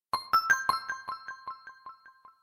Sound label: Novel, notifications